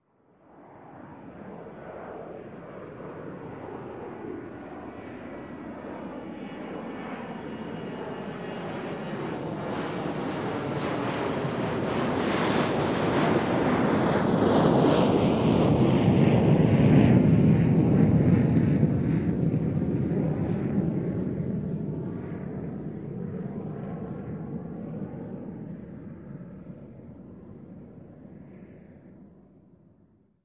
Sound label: Large Jet Take Off; Sound Ideas; Series 2000